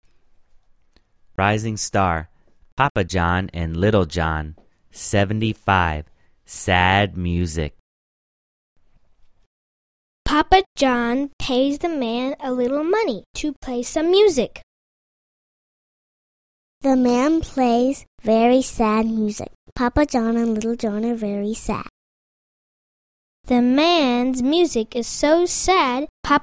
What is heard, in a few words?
sad music